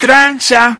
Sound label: sniper laugh long